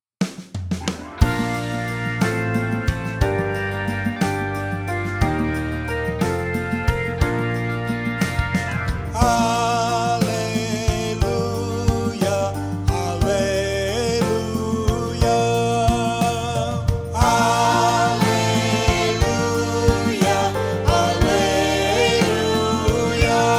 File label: Gospel Acclamation Alleluia